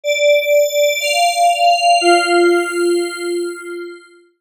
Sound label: Logo Warble Bells; Warble Sound Effects; Production Element Imaging Element Accent Transition